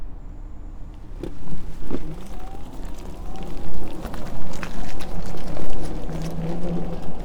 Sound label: inmotion twowheeled selfbalanced unicycle runup onboard micro; eomys